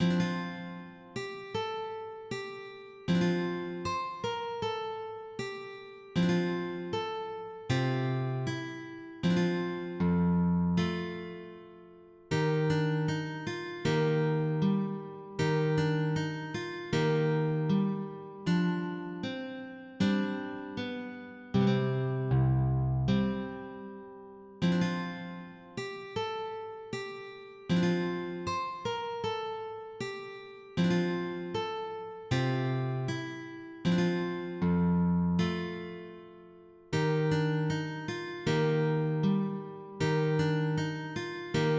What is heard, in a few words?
MIDI; Orlando sleepeth